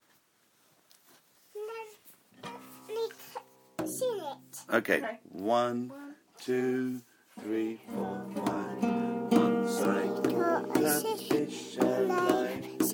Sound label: singing